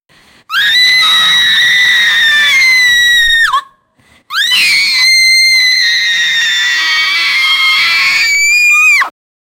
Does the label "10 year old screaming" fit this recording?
Yes